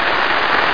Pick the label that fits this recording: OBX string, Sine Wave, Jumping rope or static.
static